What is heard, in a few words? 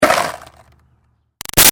Skateboard Hit Landing Short Roll; Skateboarding Sound Effects; Sports